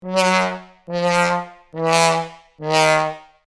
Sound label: Sad trombone wah wah wah fail comedy; cartoon comedy